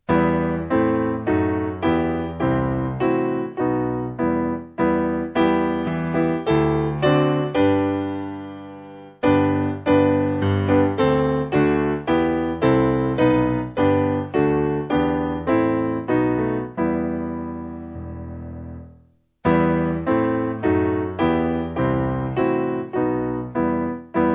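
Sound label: Piano, church music backing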